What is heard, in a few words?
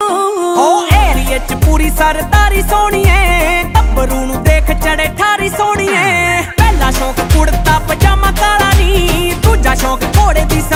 Jatt Punjabi Ringtone